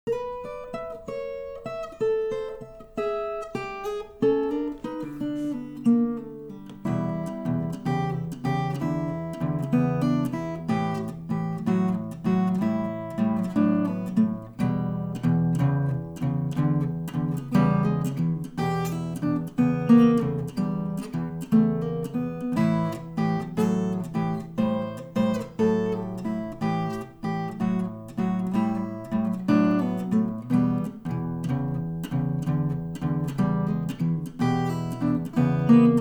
blues

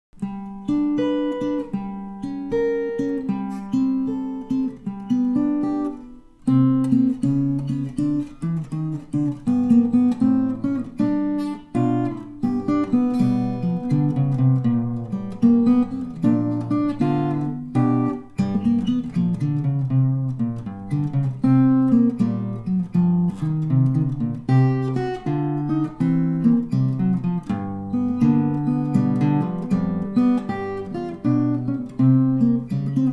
spring break blues

